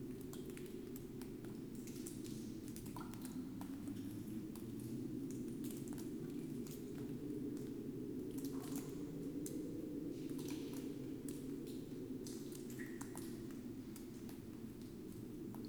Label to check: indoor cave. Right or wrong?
Right